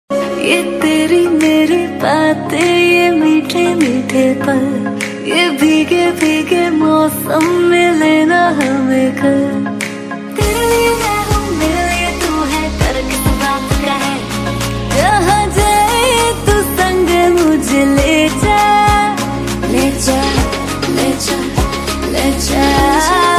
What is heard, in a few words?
Hindi Song Ringtone